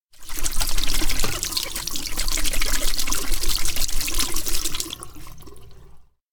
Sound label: sink whistle wash; anemoi